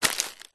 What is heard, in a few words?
foley footstep single shoe dead palm leaves; zapsplat